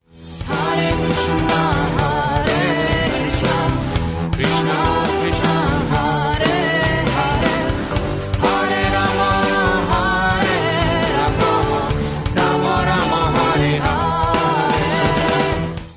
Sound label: Hare Krishna, Electro Punk